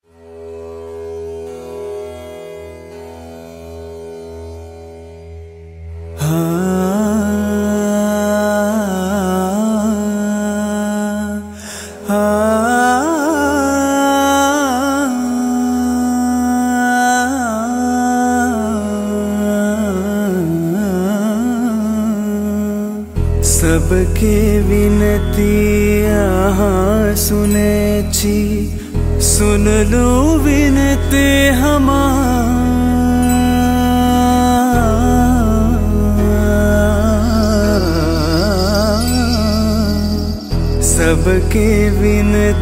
(Male); Ennathan Pesuvatho (2014)

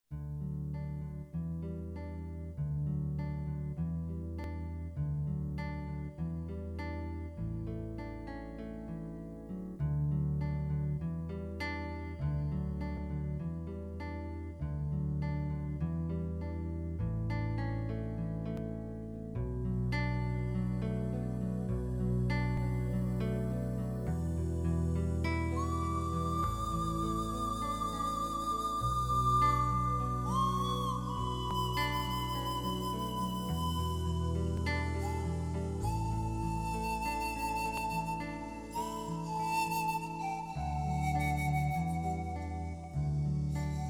piano; Anthimantarai

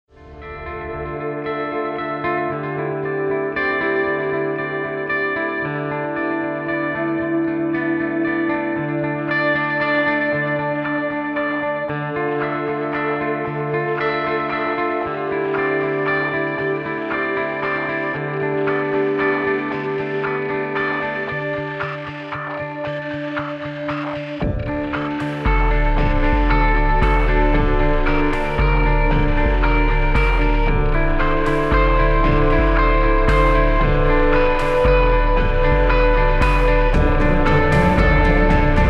Flight Crash; Sadhuram 2 (2016)

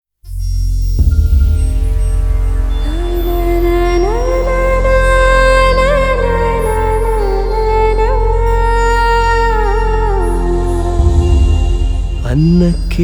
(Humming); Aadu Puli Aatam

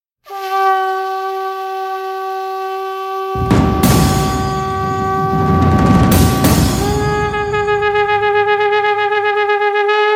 Crucial Beats Theme Music; Bhoomi (2021)

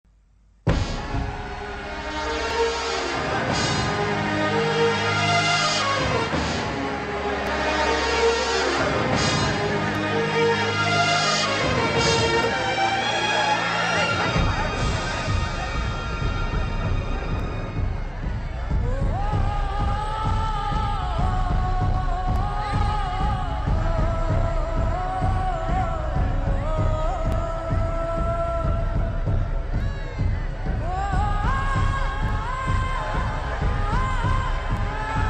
Instrumental; Padaiyappa

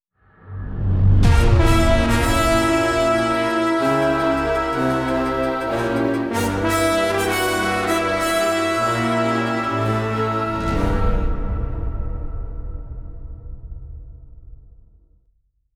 The Horns of War; Viduthalai (2024)

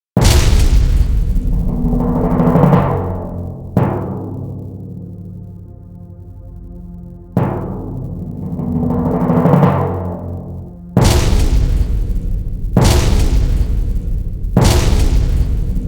Theme Music; Athimalai Muthu Pandi

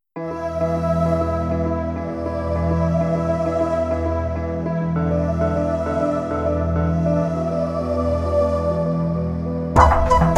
Instrumental

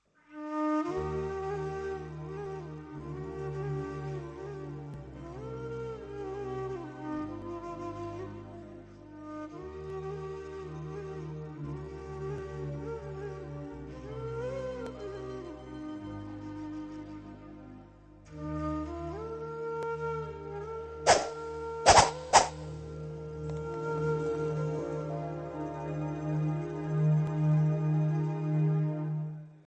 Padaiyappa Instrumental

